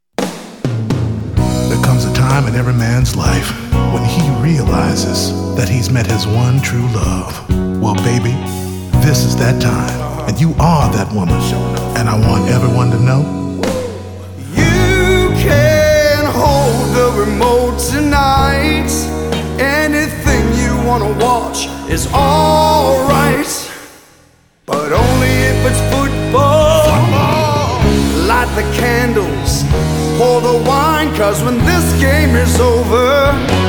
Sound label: Vocal, Music